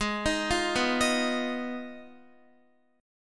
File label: Ab Minor 6th, Guitar; Abm6; arpeggiated; x,11,13,10,11,10